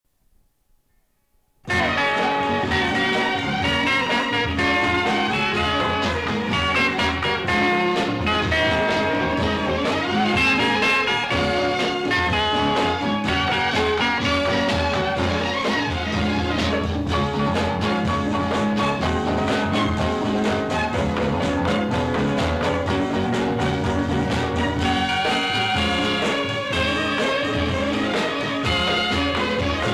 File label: Theme Music; maa bahen aur biwi 1974